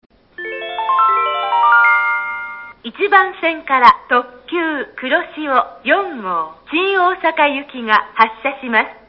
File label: wakayama track1 kuroshio4go; nishinihon